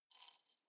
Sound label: hornet hum glass short